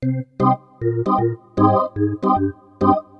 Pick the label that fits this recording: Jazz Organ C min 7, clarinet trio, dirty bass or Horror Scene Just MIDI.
Jazz Organ C min 7